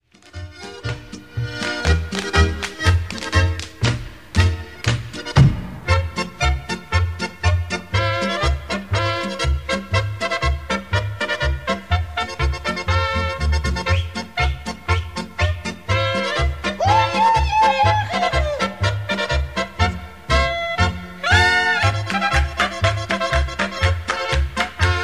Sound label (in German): Instrumental Preaner Marsch